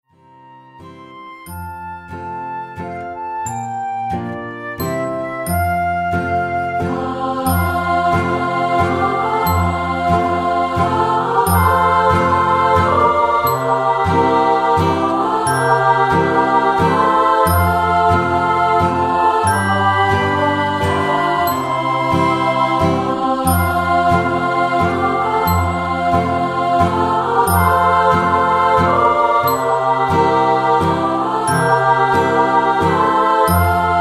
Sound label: Away in the Manger Choir Accomp